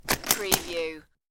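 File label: Guitar Latch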